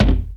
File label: hammer; wob2 demo